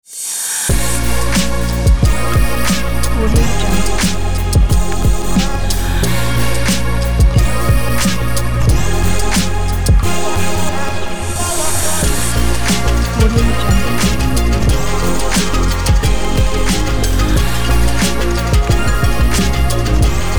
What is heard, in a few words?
fashion hip hop intro ident